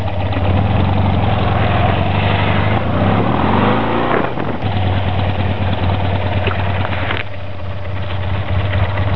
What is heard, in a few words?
startup; Tune Up